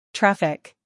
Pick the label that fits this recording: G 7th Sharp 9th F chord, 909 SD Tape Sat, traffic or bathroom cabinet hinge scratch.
traffic